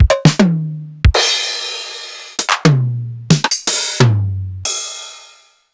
Roland TR707 sliced; m8

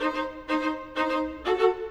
Violas, Orchestral; Sonic Foundry (Sony Creative Software) - Orchestral VOL-2

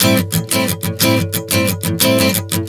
Strum 090 Am, One Chord Strumming 090 bpm

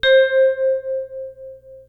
PPG C4, synthesizer; Hollywood Edge; Giorgio Moroder Rare Synthesizer Collection; PPG.2.3

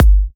G, kicks; markus hakala kicks

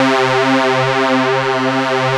Juno pulse; Best Service Dream Experience

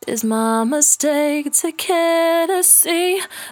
68bpm D, Velvet Vocal; Zero-G

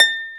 HRP C. HARP; Roland - LA Composer vol. 1

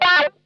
COARSE LICK, Funk Guitar; Zero G - Funk Guitar

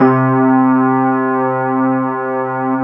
FENDER C2 R, Keyboards Old School; USB Soundscan vol.09; AKAI

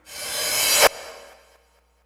crash reverse, Hardcore Breakbeat; Big Fish Audio; Hardcore Breakbeat Action

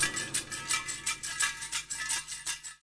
85 bpm; THERE U R; 90 s Sample CDs; Planet of the Breaks

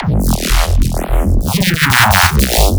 reese

